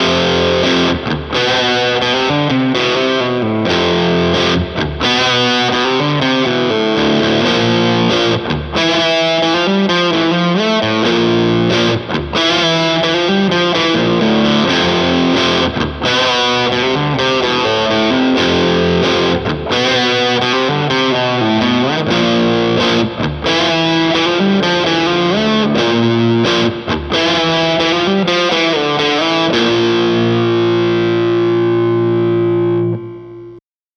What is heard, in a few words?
Music Man Mariposa